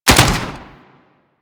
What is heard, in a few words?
SMG Alt 3D Fire; Weapons; Warframe; Tenno; Sundial